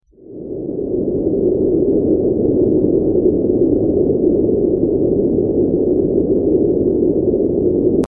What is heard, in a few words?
Ambient Wind; horror sfx vol 1